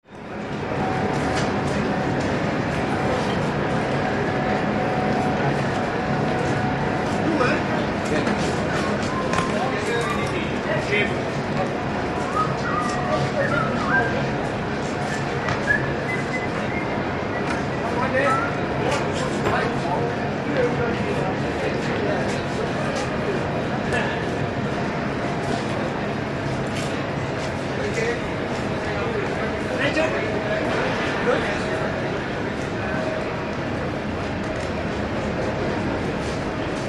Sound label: Train Stn London, train station